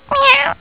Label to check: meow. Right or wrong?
Right